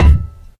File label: physics cube impact hard